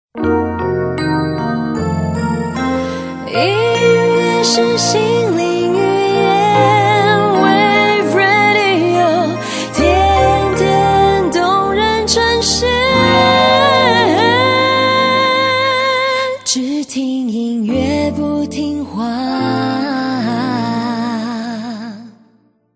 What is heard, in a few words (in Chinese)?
抒情短版